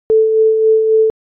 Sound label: tremolo; adlib